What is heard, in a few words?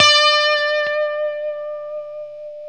Les Paul, guitar; Spectrasonics; Hans Zimmer Guitars VOL-1